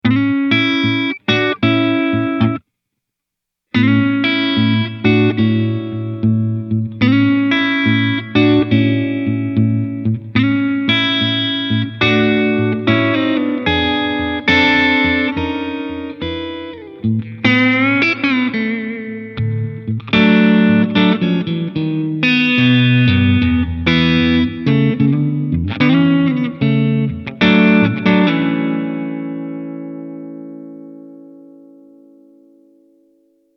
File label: Hall Modern Noon